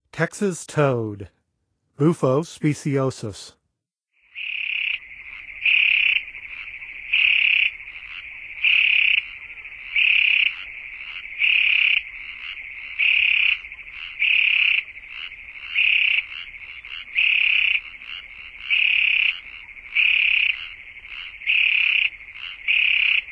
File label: Texas Toad; N. America; Songs of Frogs & Toads of North America